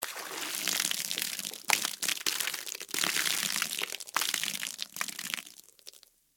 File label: Soda Pour Ground; Foley; Pouring; Objects; BlastWave